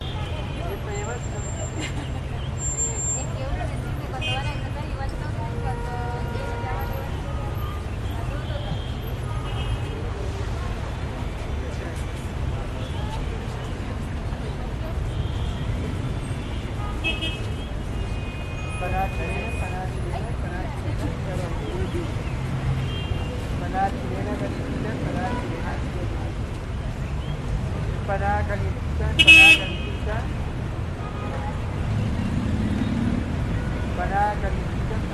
street traffic man talking spanish in distance walla cars honking; la paz; your free sounds